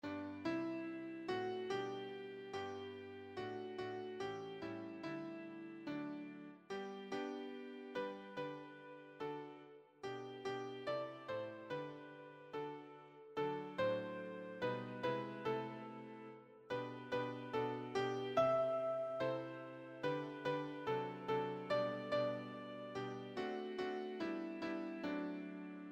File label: piano